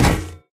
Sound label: physics turret impact sm